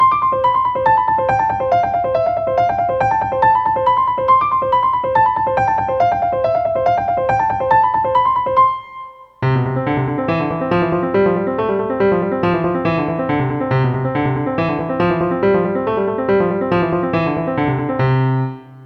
Exercise for 4th & 5th Fingers; 4-5-4-1 Exercise for the 4th & 5th fingers; Technical Exercises for Improving Keyboard Technique

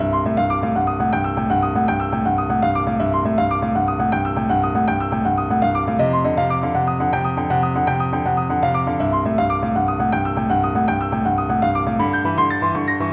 Exercise in Yodeling 6ths

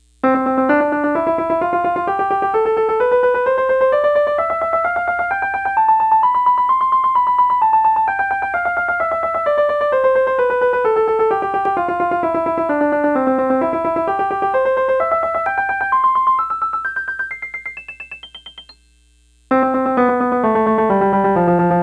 Repeated Note Exercise

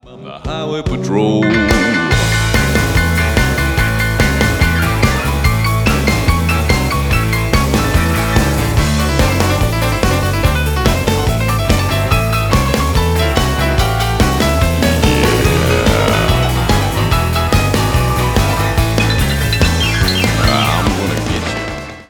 Piano Solo

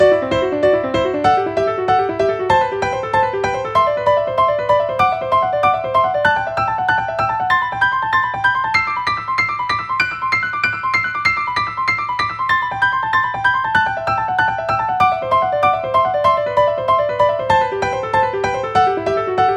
Exercise in Alternating 9ths; Difficulty: Challenging; Technical Exercises for Improving Keyboard Technique

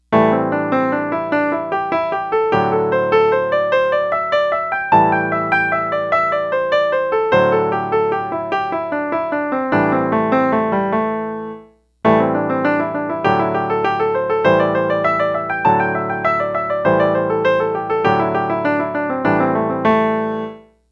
Pentatonic Exercise

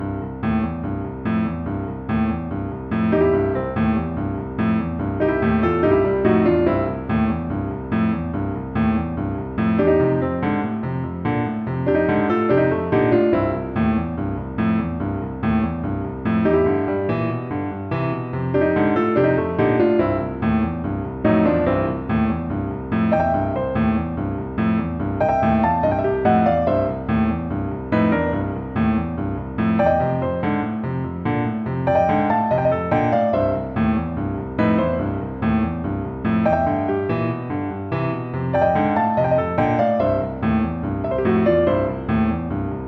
Boogie-woogie Left Hand Pattern

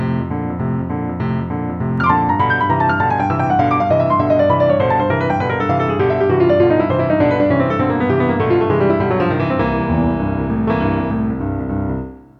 Exercise in 6ths

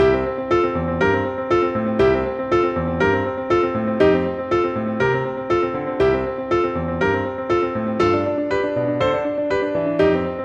Repeated Note Exercise on the Most Common Cliche

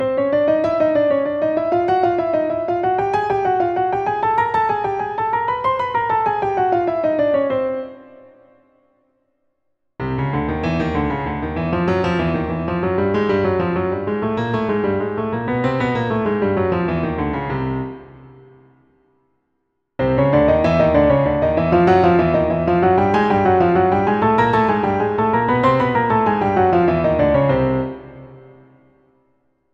Exercise in Legato, Fingered Octaves; Technical Exercises for Improving Keyboard Technique

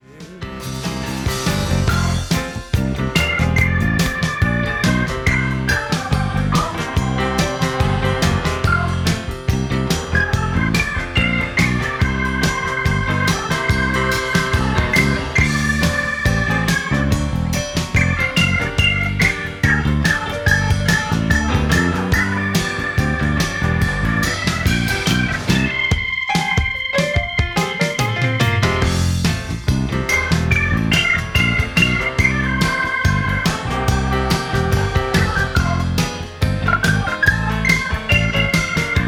Organ Solo

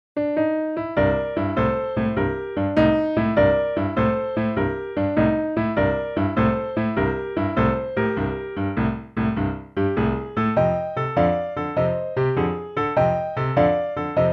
Blues Exercise No.6 (9th Chord Boogie)

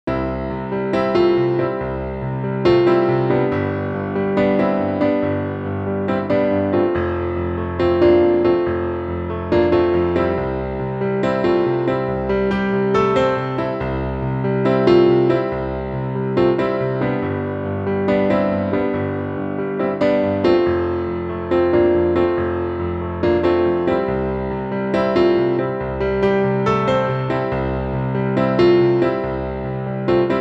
IV chord Bump Exercise; Technical Exercises for Improving Keyboard Technique

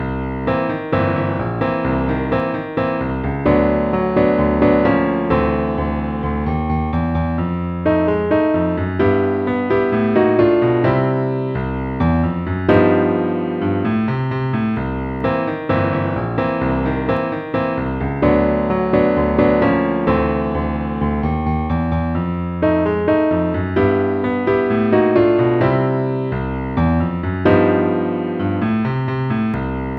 Exercise - Elements of R&B & Funk; 3 Elements

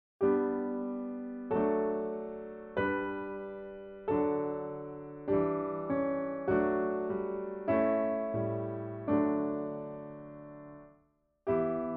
Chorale, piano; Bach; Chorale No.67

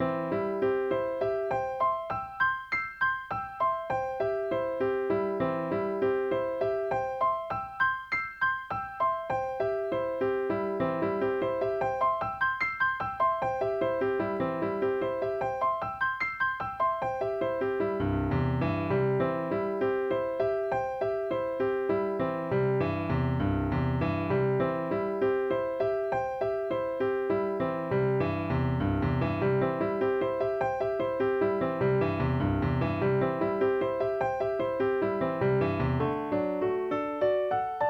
Double note Exercise for Arpeggios

